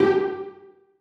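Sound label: celli pizzicato f#4; Sonatina Symphonic Orchestra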